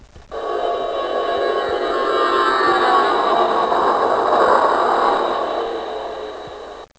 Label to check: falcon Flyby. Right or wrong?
Right